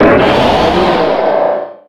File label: pokemon cry